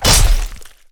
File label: Attack; Wileshroom